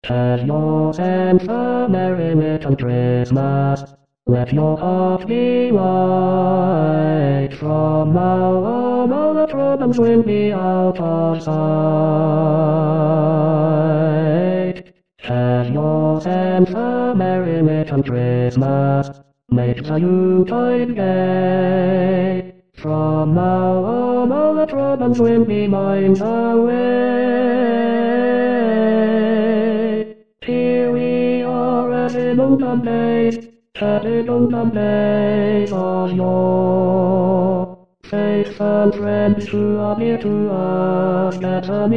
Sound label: Tenor